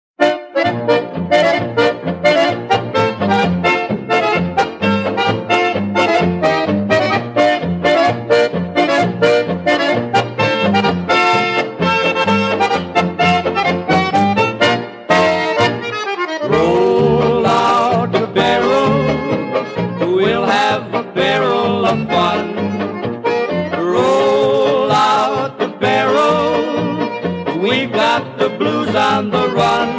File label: Beer Polka